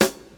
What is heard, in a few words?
original snare f sharp key